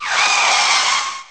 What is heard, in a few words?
car stops